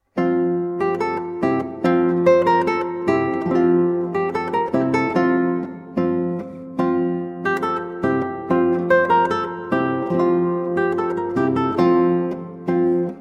8 String Guitar; Orlando Sleepeth; Dowland; John Dowland

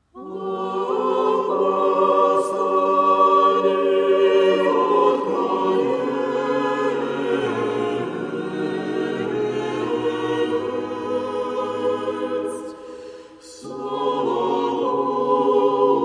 Hymn of Light, Church Choir; In thy Dormition; Putinki Church Choir

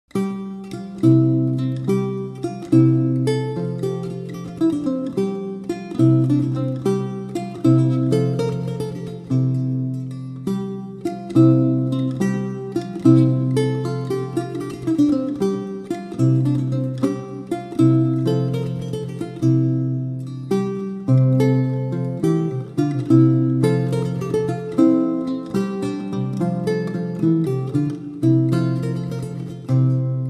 Branle Poictou, Lute; Pierre Attaingnant

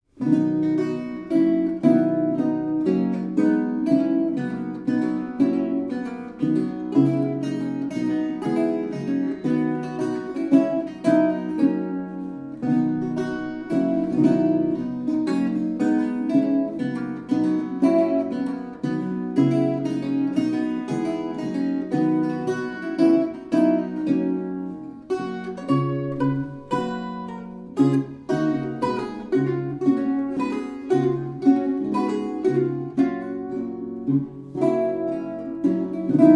Sonata II Minuet, cetra o kitara; De Marzi; Sei sonate per cetra o kitara

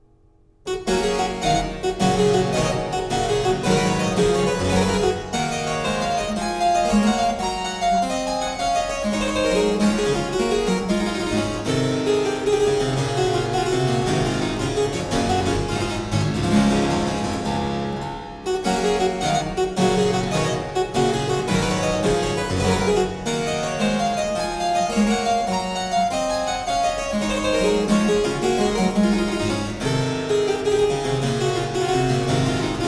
Suite 2 in G Minor, Harpsichord; La Choquante; Joseph Bodin de Boismortier

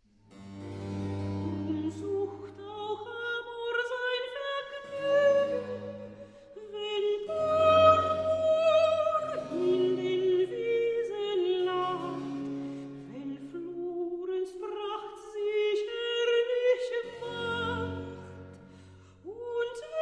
Recitative; Weichet nur betrubte Schatten; Bach; Cantate

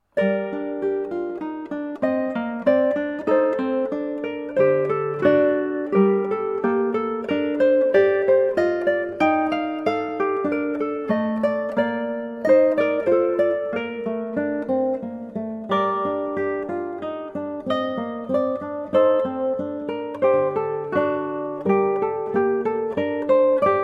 Guitar and Ukulele; Prelude 10; short preludes BWV 929; JS Bach

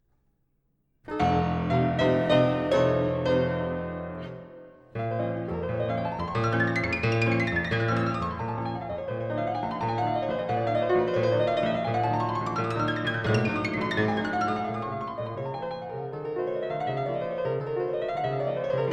Preludes Op 28 No 16 in b flat minor, Piano; Frederic Chopin

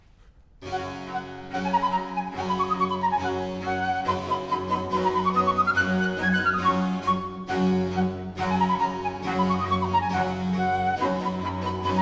Tambourins Suite; Tambourins 1 2; Les Fetes D'Hebe; Jean-Philippe Rameau

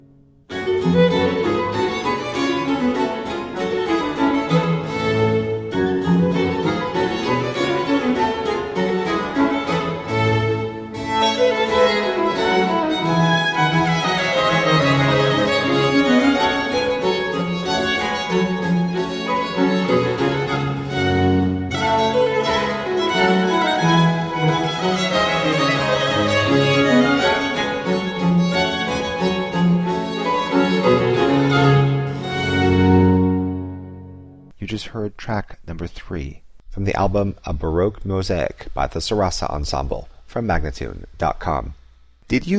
Balletto; Partia No 5; Heinrich Ignaz Franz Biber von Bibern

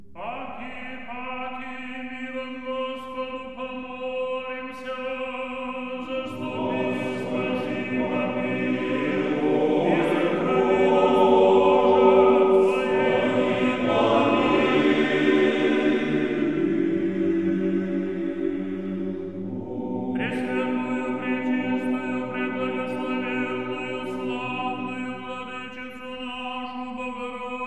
Small ekteny, Choir; Kiev Theological Academy and Seminary Choir; Canti religiosi tradizionali di Kiev

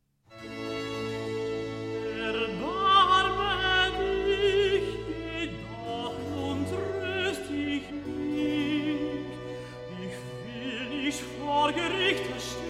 Recitative; BWV55; Bach; Cantatas I

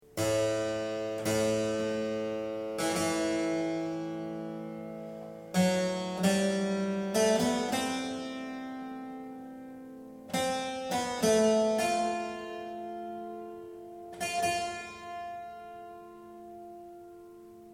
Link, Dark Harpsichord Music

